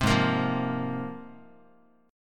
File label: Ab 6th Add 9th Ab, Guitar; strummed; JGuitar